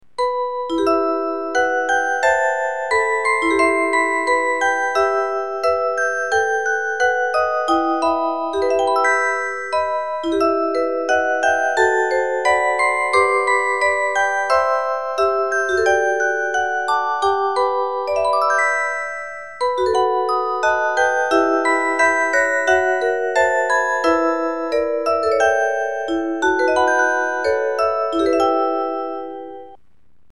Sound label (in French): reuge, boite a musique; reuge solvejg